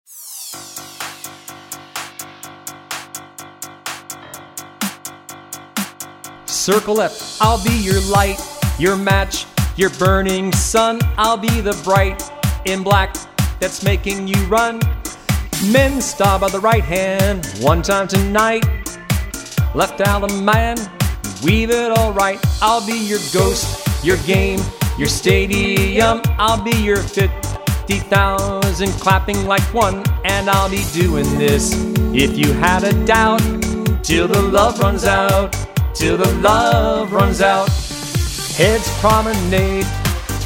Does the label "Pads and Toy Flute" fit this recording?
No